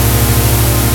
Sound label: Wall of Bees; Mo' Phatt; Digital Sound Factory Mo' Phatt